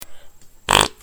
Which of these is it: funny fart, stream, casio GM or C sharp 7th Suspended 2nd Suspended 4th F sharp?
funny fart